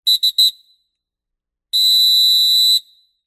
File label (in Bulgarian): съдийска свирка; Fox 40 Epik; Break-A-Way